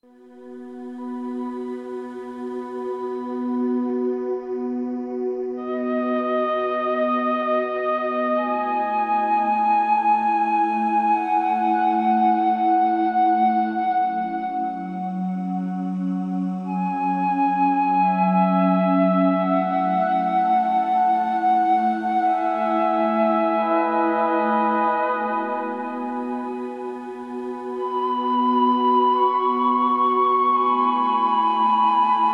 astral flute short; gamerip; black circle a carol reed mystery

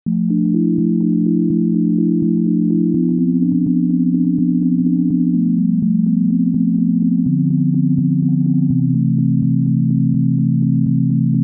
Electromagnetic Storm; ps vita; gamerip; stranded a mars adventure

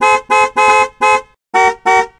Horn Happy; Blastmorph Pinball; xbox series xs

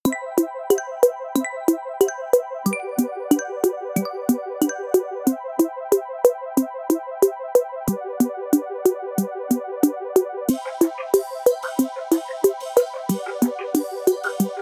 92 Bpm; Followers

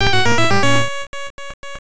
Player Death; Castlevania The Adventure; GB

